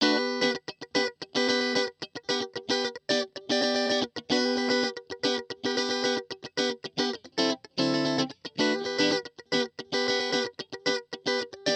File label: fender funk eq comp; universal audio ox amp top box